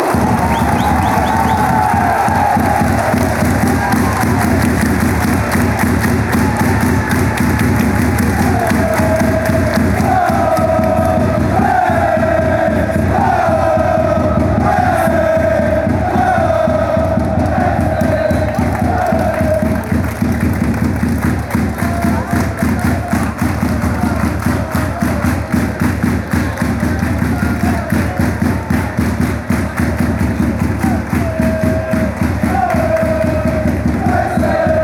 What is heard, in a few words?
racing hey trommel, chants